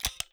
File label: button up; Papers Please